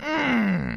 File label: ooh ahh, human; Voice